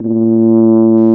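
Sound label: brass French horn; Roland - LA Composer vol. 1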